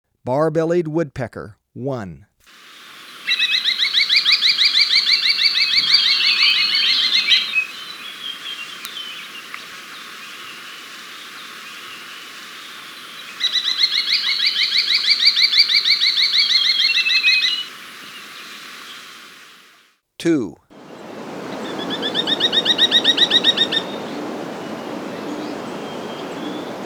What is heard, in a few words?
Bar-Bellied Woodpecker, Birds of Ecuador; S. America